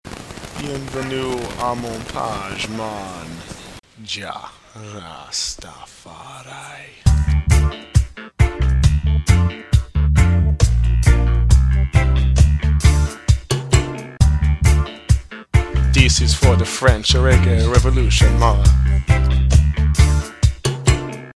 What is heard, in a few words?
French Reggae